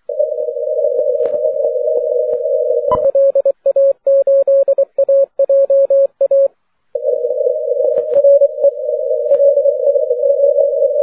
40m cw

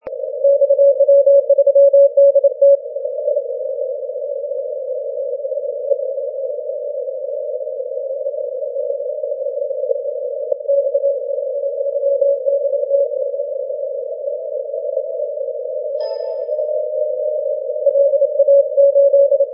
10m cw

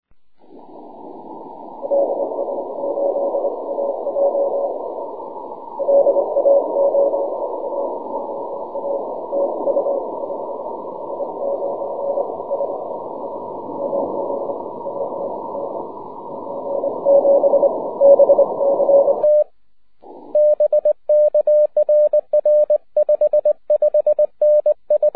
6m cw

